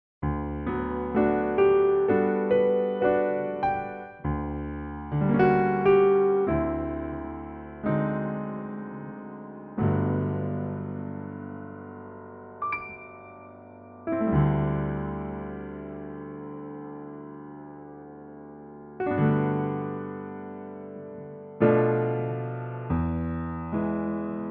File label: piano